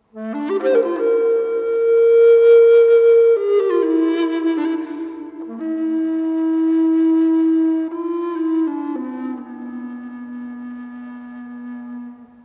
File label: hymn